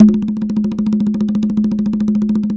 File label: CONGA ROLL